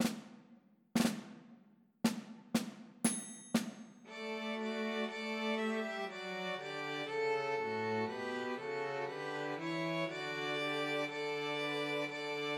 Christmas Trios for Two Violins and Cello; Good King Wenceslas

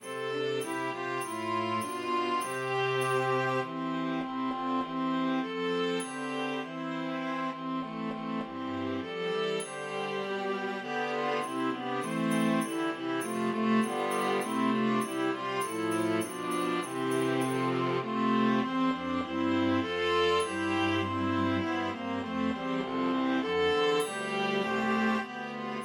Viola Track with Melody; The Holly and the Ivy

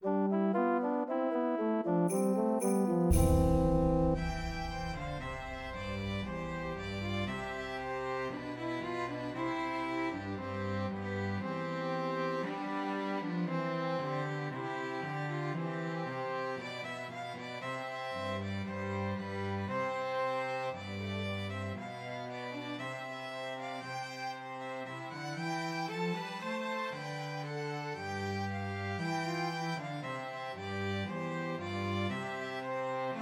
Christmas Trios for Two Violins and Cello; Deck the Halls

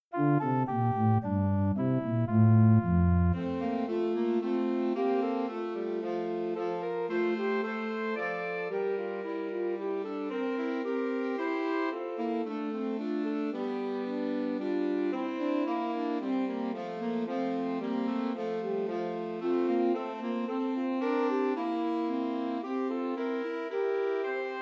Two Altos and Tenor Saxophone; Christmas Trios

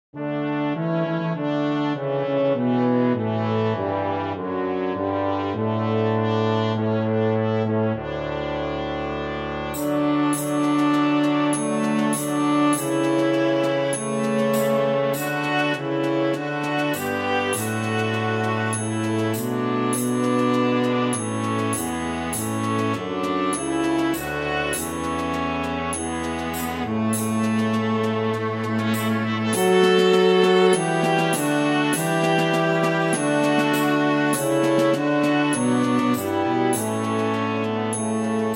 Viola track with Melody; O Come All Ye Faithful

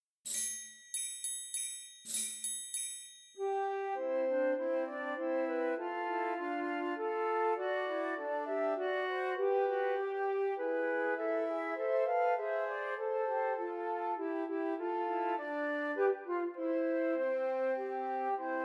Trios for Three Descant Recorders

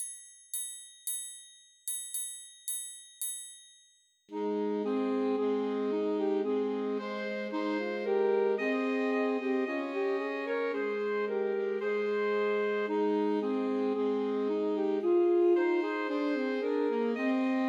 Christmas Trios for Three Alto Saxophones; Hark The Herald Angels Sing

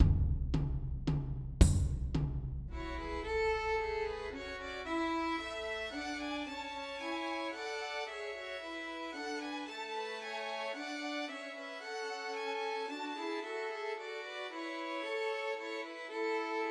Trios for Three Violins; The First Nowell; Christmas

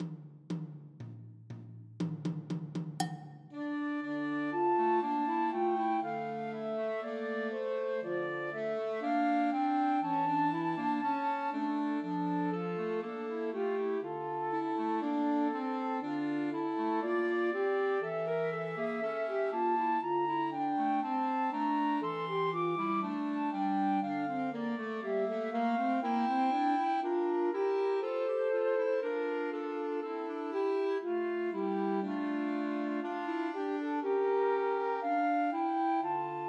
Trios for Flute Clarinet and Alto Saxophone; God Rest Ye Merry Gentlemen; Christmas

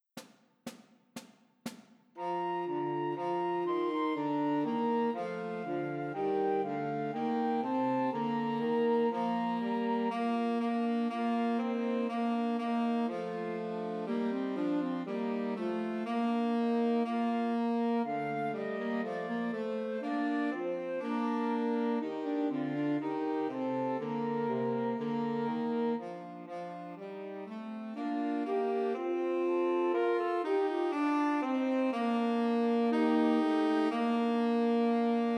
Trios for Flute Alto Sax and Tenor Sax; Christmas; Good King Wenceslas

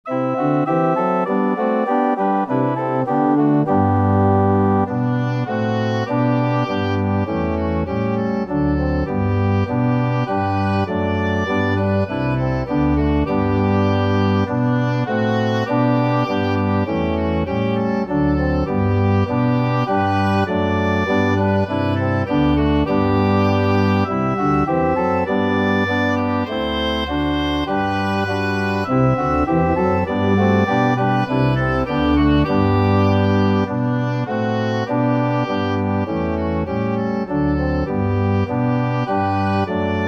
Oboe Track with Melody

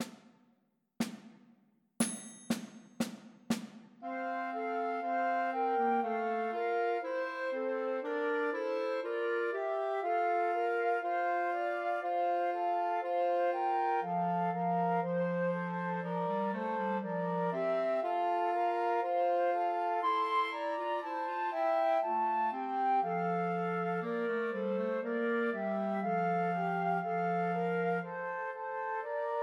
Trios for Two Flutes and Clarinet; Good King Wenceslas; Christmas

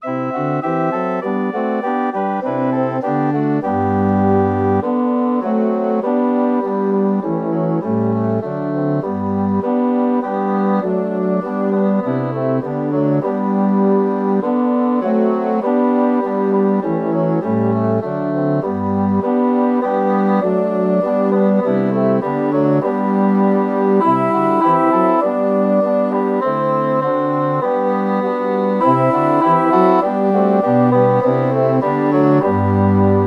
Mini Bassoon Track with Melody

